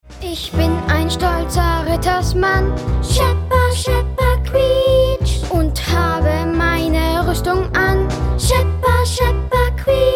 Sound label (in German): Ritter Schepper Song; mitternacht auf schauerstein musical